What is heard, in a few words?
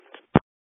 Phone Click Off